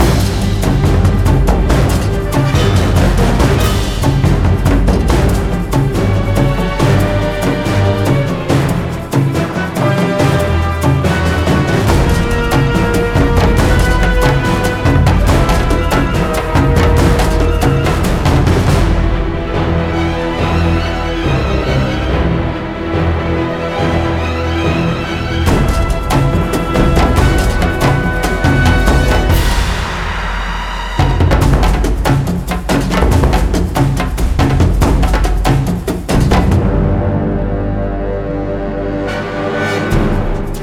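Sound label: Mid Combat loop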